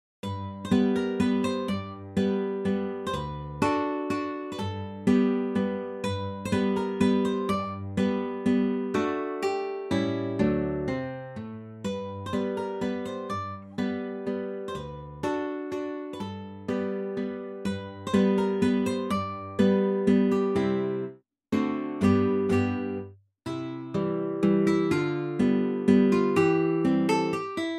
mazurka

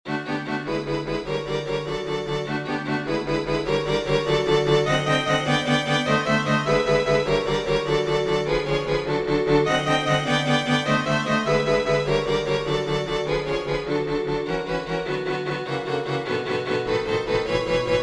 string quartet

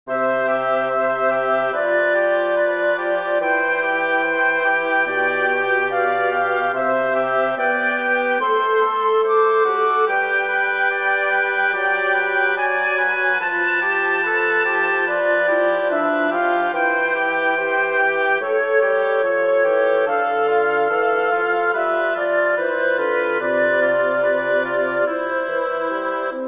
adagio cantabile for woodwind quartet; beethoven ludwig van

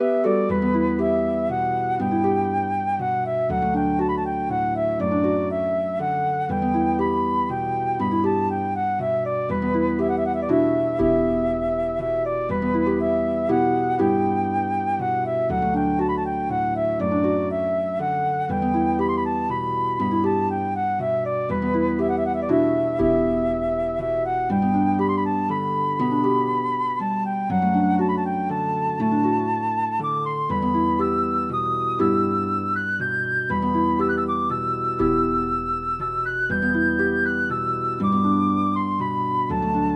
for flute harp

